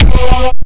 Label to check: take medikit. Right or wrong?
Right